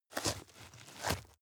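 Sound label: step dirt heavy boots scuff, footsteps; schritte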